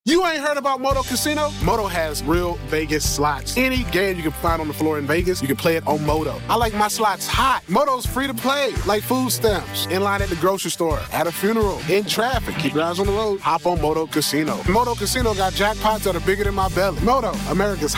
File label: patient plays clarinet during brain surgery; parkinson's